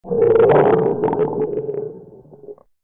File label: stomach growl